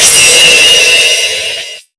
Hats, Alesis Performance-Pad

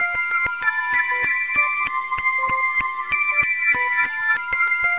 Pulse Synth; Sonic Foundry (Sony Creative Software); Crimson Blue and Fabulous Horncraft 4 RnB

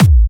Trance Kicks

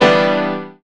chords

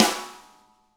DW RIM; DW KIT2

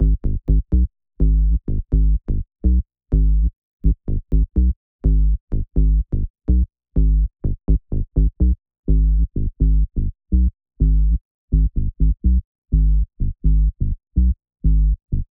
125BPM SPARSE BASS G#; Bass Loops

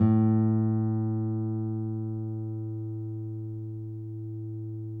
NYL A, FLAMENCO NYL; Spectrasonics; Hans Zimmer Guitars VOL-2

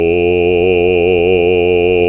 BASS B, Roland; Sound & Vision - Gigapack II CD 1; GREG. BASSES

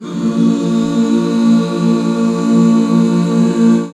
Bb 6, back chords; Sound & Vision - Gigapack II CD 1

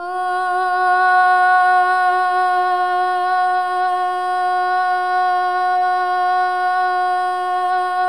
solo boy; Spectrasonics; Symphony of Voices

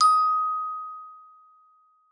D#4, GLOCKENSP S; Xsample VOL-4

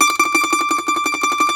MAND TRM, Mandolin

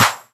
Claps; Vengeance - Essential Clubsounds Vol.5

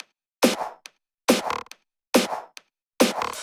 Loops 140 BPM Hihat Percussion Loops

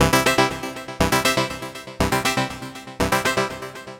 Major Arp; Dr. Fink's Funk Factory

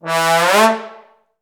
TB GLUP A2; Best Service; Peter Siedlaczek's Advanced Orchestra

